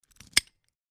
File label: Padlock close snap; locks keys